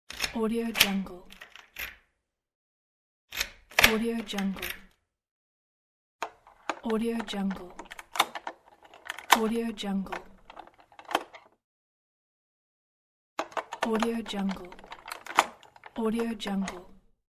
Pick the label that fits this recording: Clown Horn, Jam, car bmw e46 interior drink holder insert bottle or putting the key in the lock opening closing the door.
putting the key in the lock opening closing the door